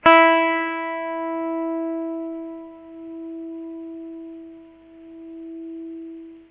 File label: high e, guitar single notes; clean